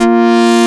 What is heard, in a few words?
AN SYN, SYN & LEAD; SAMPLE WAVE MIXING 2